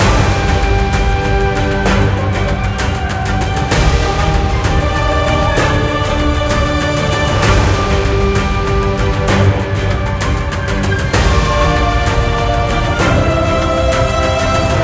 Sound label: big Win Loop; temple of nudges